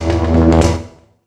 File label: ambience disaster arc